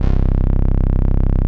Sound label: 505, String Machines